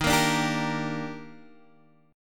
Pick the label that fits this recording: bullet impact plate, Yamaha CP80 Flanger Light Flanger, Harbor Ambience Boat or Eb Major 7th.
Eb Major 7th